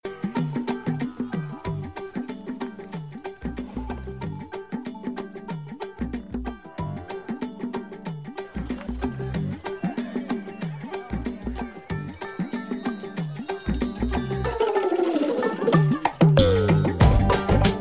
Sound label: funny tune; Dangal